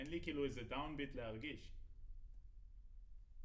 Room A

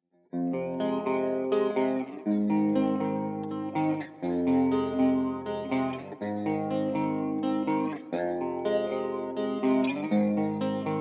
Guitar

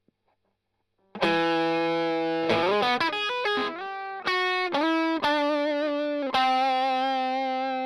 Strum Line

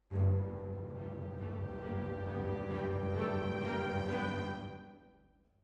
Hall

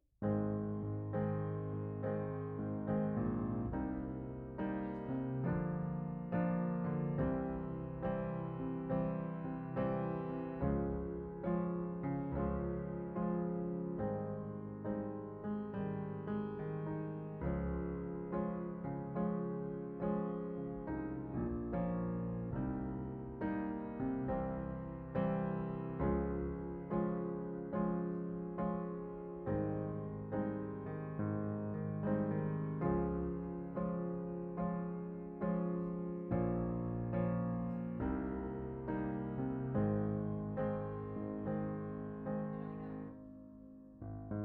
Piano Mono

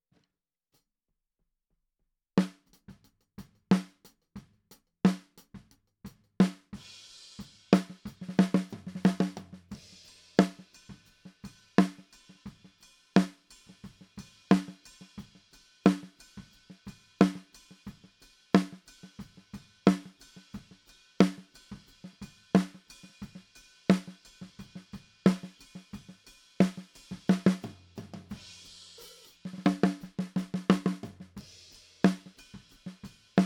Snare Top

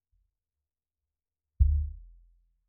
Sub

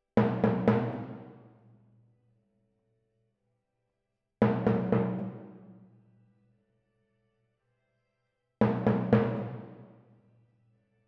Twin F Timp

